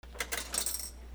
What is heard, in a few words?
MONEY